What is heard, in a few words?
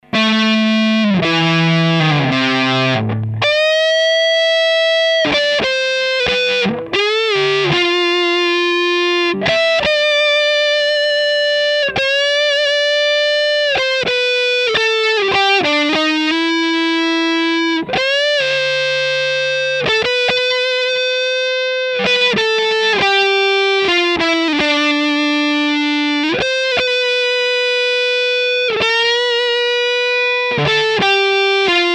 Lead Neck